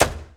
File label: Ferrari 355 Hood Close; Automobiles